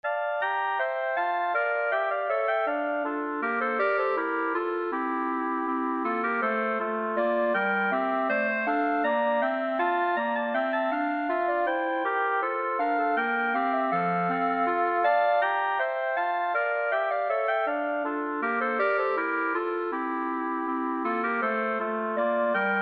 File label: bourree, clarinet trio